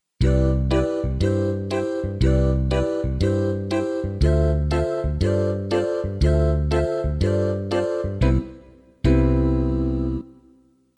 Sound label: jingle